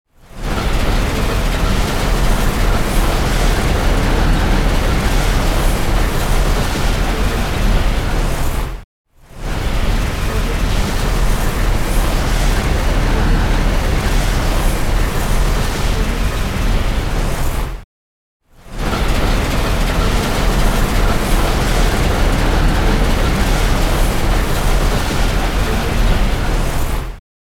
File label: nuclear reactor